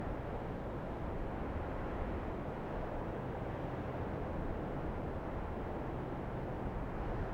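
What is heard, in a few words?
desert loop light wind; ambient; atmosphere; Counter Strike Model Pack; CS:GO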